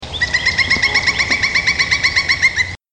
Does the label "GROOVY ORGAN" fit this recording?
No